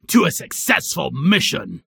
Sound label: Bar Cheering when drinking